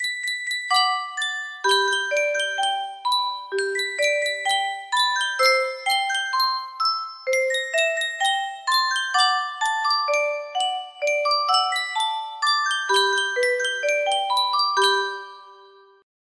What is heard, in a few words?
Yunsheng music box, music box melody